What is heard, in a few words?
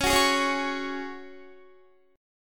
Db Suspended 4th Db, Guitar; strummed